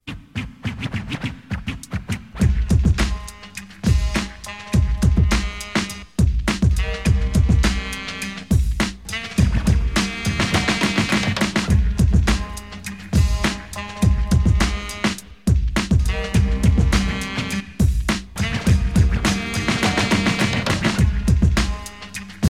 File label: touch of jazz